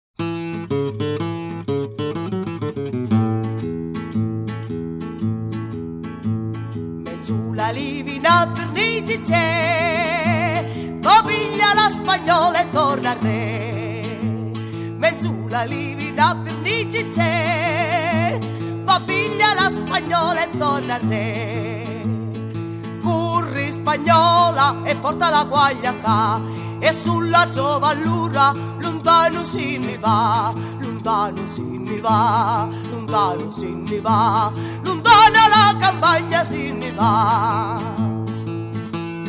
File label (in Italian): Canto di caccia